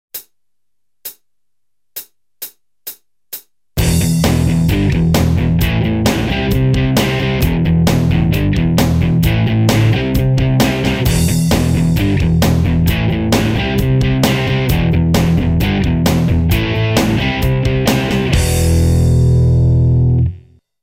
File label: Garage Rock, guitar